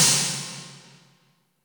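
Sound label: EQ SNARE DRUM; 90 sSampleCDs; Crypton - DrumBase5000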